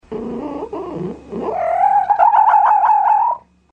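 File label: fox sound; lisy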